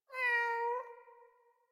Kitten Meow Playful; Enemies; Warframe; Catbrow

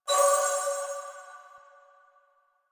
Choir Bow Charge Full; Warframe; Weapons

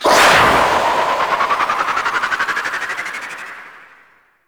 Archwing Healer Engine Boost Slow, Vehicles; Warframe

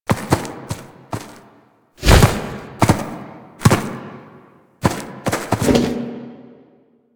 Ram Idle Lunge; Duviri; Animals; Warframe; Quests

